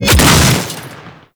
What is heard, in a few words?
orb explode; Mod Quest; Warframe; The Teacher